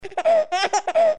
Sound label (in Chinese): 婴儿哭